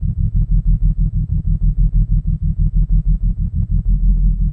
Eyeball Idle

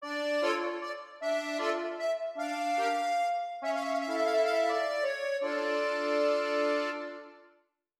accordion sting; Hollow Knight

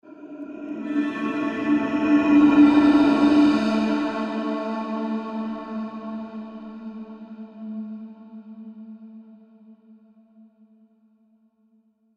111 BPM; Laundrette

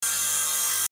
saw; Blackeye

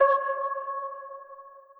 Key Found

